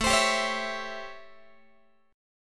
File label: A 7th Sharp 9th A, Guitar; A7#9; strummed